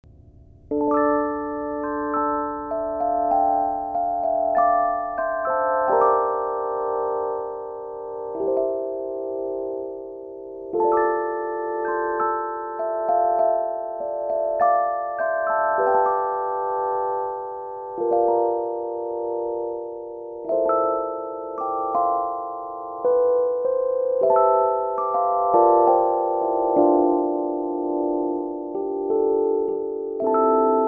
rhodes delta trem; effectrode

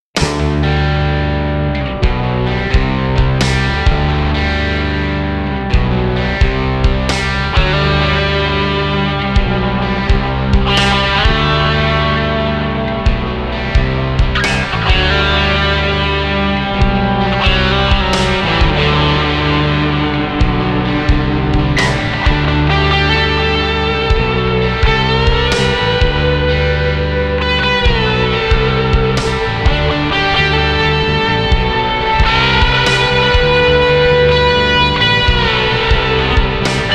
tube drive classic, tube drive sounds; classic aor

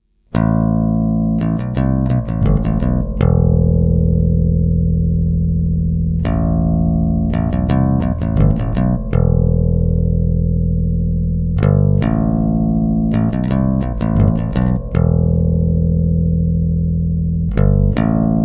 bass riff

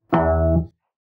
bass note normal speed; doctor who

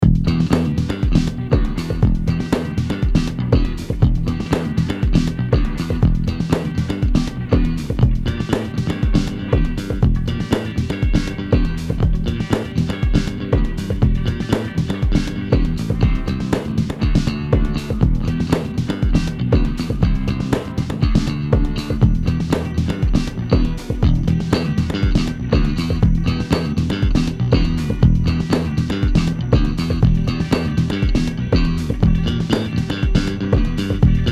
tube fuzz bass; helios; effectrode

